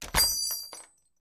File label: Bells Shop Jingles